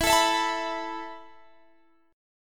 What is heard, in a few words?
B 7th Suspended 4th E